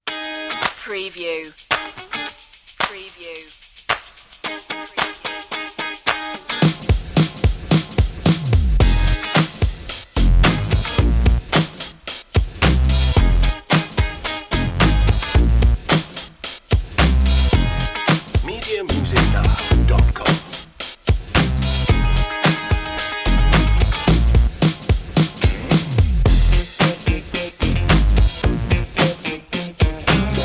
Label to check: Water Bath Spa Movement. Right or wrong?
Wrong